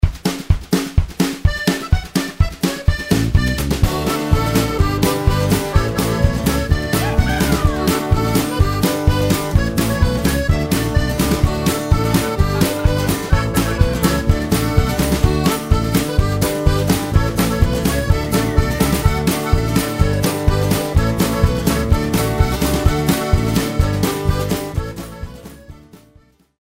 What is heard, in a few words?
Polka